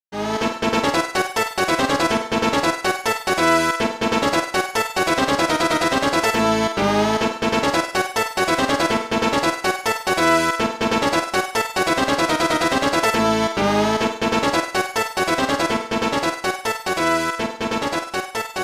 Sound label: Victory!; ranma 1 2 chougi ranbu hen snes 1994